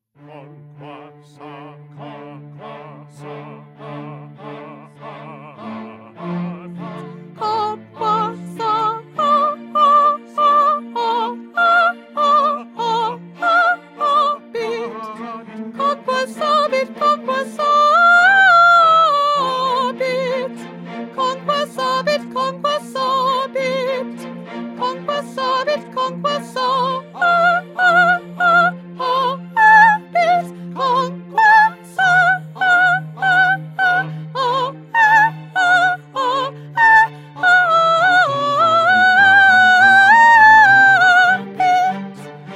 1st Soprano

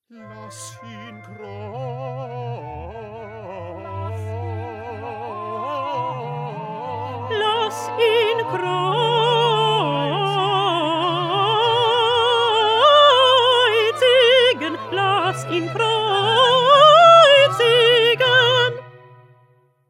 Alto; Bach; St Matthew Passion

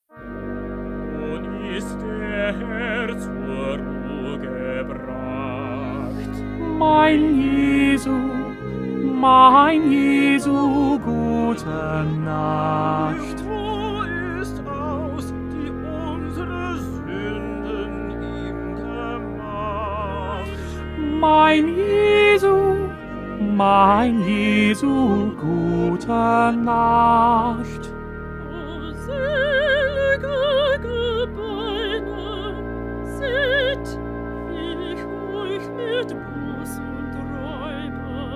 Tenor; Bach; St Matthew Passion

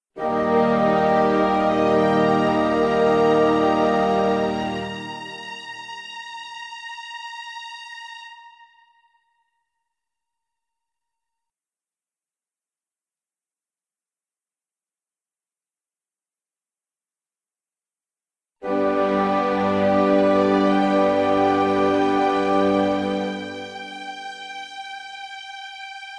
Instrumental; Mendelssohn; Elijah

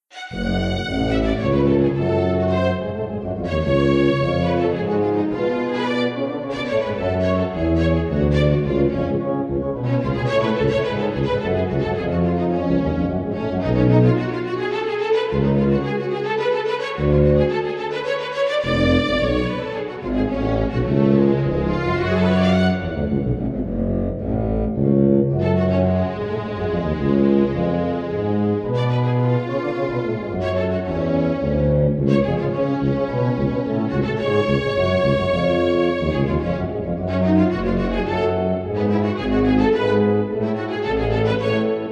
Magnificat Instrumental; Bach

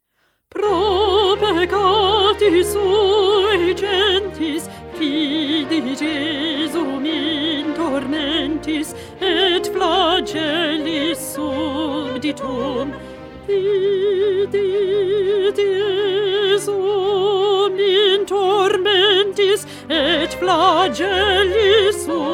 Alto; Pergolesi; Stabat Mater

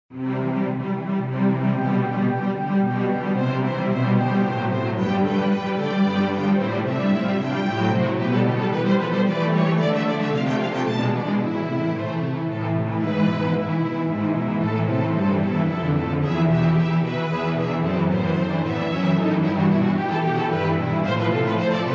Instrumental; Bach; St John Passion

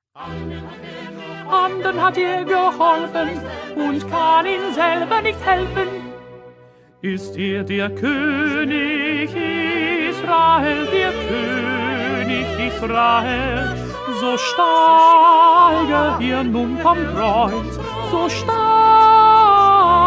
1st Tenor

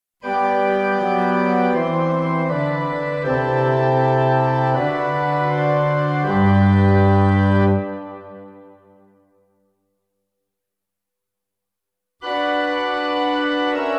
Instrumental

